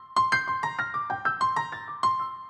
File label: Latin Lounge Piano